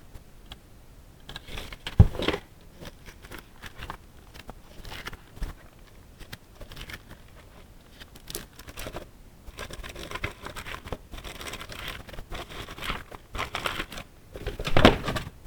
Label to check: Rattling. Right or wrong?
Right